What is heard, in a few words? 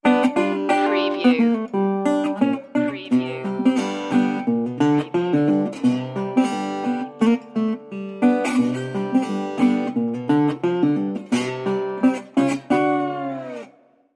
blues